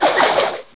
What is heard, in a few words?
shurik